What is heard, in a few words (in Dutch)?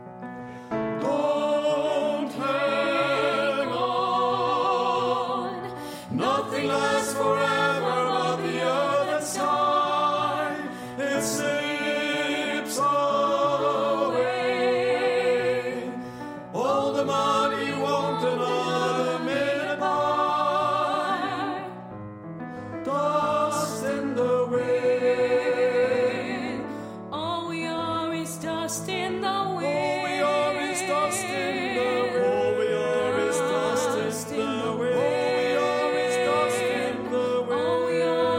alle stemmen